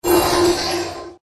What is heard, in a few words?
Earth Spirit Dodge